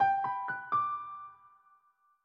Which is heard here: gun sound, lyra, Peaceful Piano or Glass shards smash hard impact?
Peaceful Piano